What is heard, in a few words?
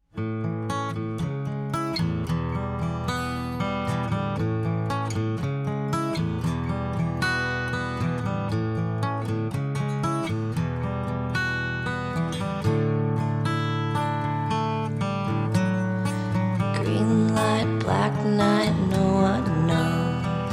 (Acoustic)